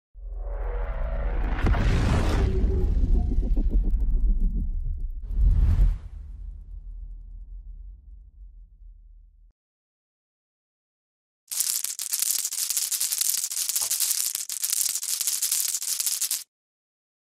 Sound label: Marbles, قل خوردن تیله